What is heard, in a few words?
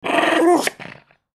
mad dog